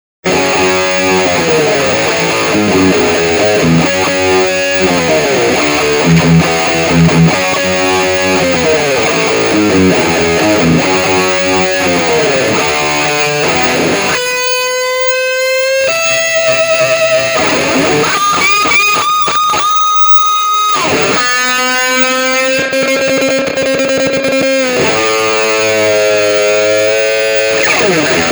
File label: Intro Right, Guitar